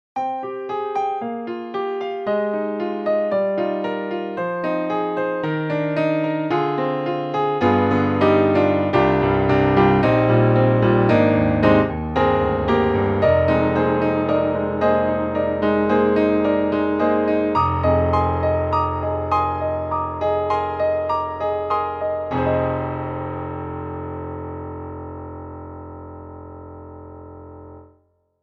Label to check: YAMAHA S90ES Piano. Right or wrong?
Right